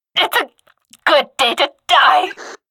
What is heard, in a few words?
death